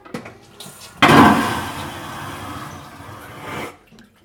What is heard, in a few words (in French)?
Chasse d eau